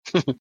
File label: giggle